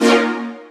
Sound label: lead, One-Shot Synths; Crowdsourced Sample Pack; TRUTH-HZ